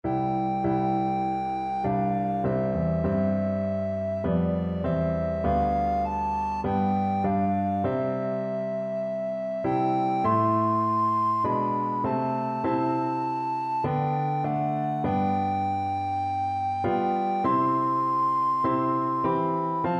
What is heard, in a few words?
it is well, alto recorder